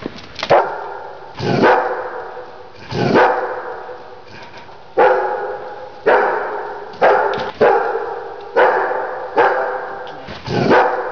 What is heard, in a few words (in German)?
Hund